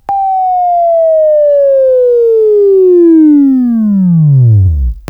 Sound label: WAV Right, Sweeping